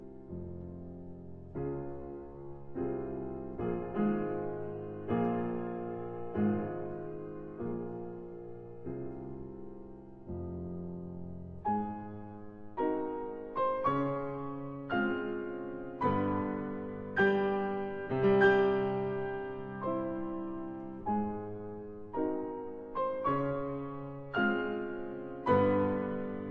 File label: Piano Sonata in F minor, Quasi variazioni Andantino; Piano Sonata No 3; Op 14; de Clara Wieck